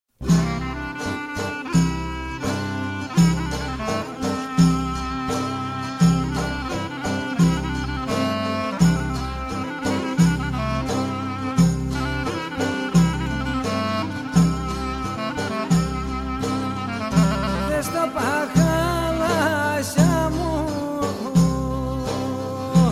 greek folk

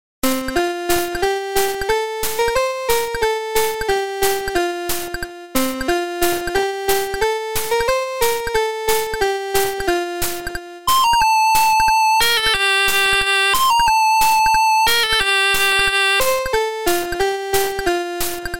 Casio VL Tone

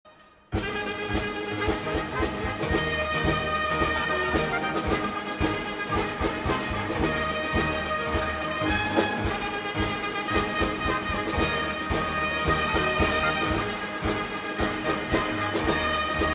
post horn gallop

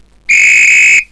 referee whistle; games; ringtones

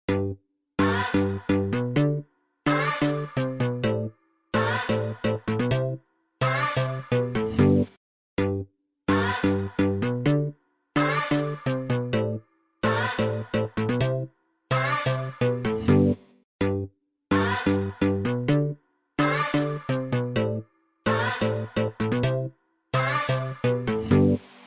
Intro loop

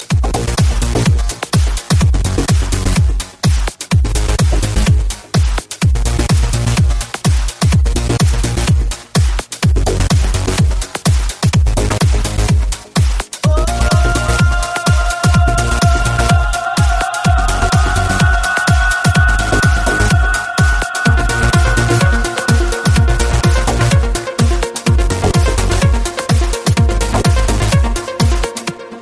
Mega Drums

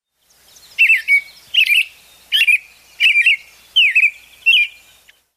turdus migratorius; animals

